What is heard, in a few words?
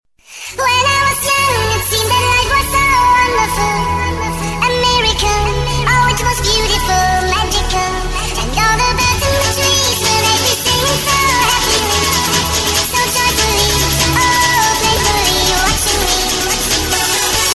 english song ringtone